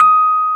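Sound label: electric piano D#5; AKAI S-Series CD-ROM Sound Library VOL-8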